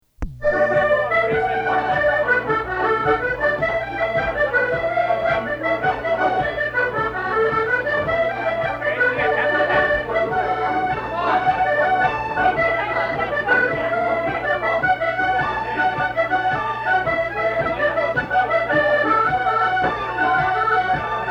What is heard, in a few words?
Jig; Seamus Cooley-s Jig